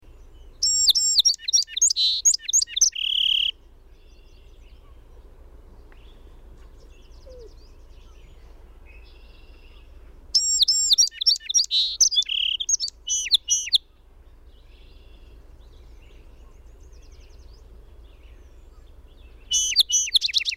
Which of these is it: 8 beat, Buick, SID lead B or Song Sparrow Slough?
Song Sparrow Slough